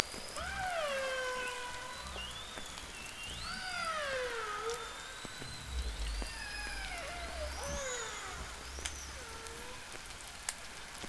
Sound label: hydrophones, lime kiln